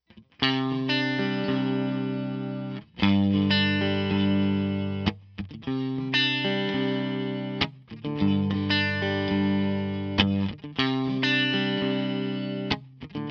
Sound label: Clean Single Coil